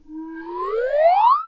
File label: ascending; jibo